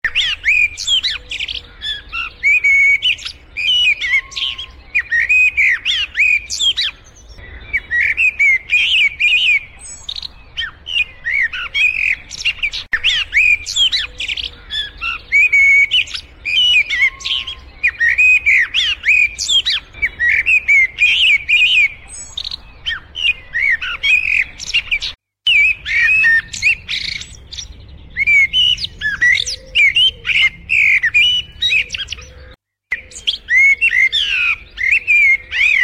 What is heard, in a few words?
Blackbird song and warning